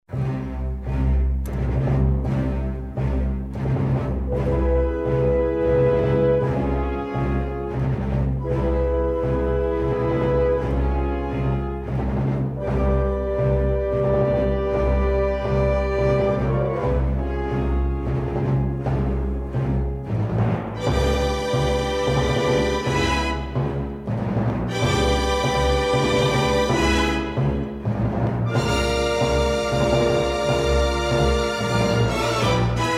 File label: Space - Ambient; Imperial Attack 1